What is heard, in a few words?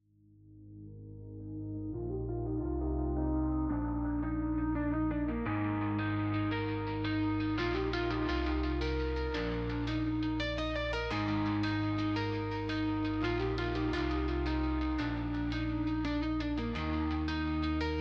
rock